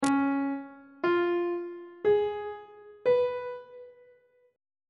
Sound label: c sharp dominant 7th chord intervals on treble clef